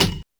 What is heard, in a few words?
percussion; VES1 Percussion